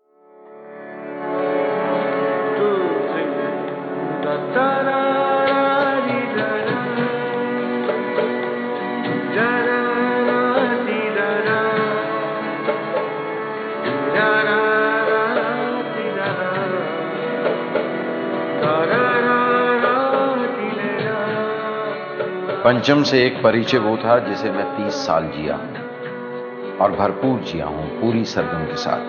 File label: with Dialogues